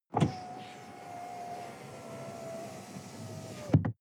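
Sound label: sports car interior window close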